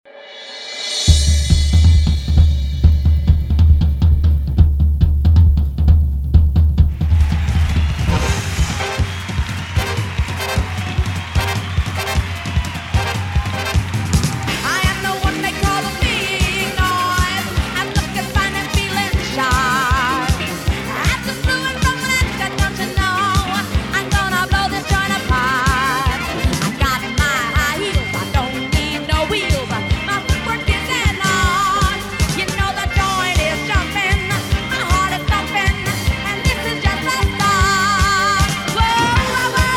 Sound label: BATON; Big Noise